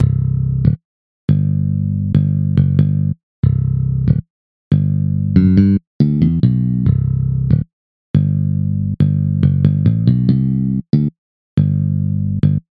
bass

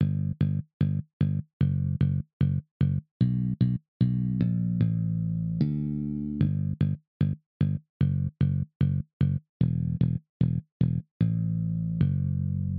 bass

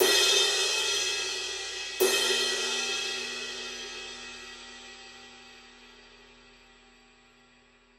cymbal

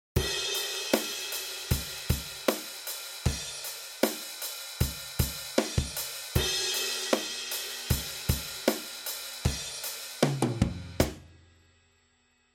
no drum

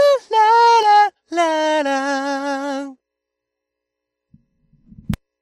noise

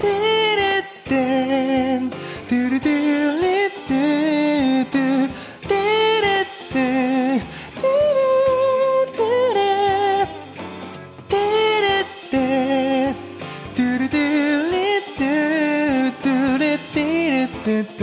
vocal after

